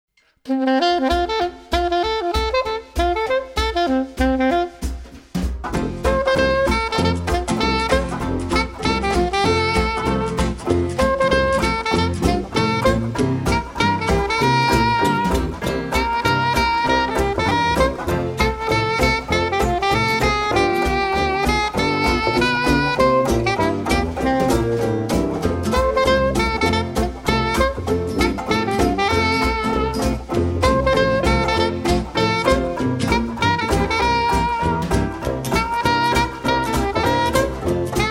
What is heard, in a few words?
Bugle Boy March, Oldtime Jazz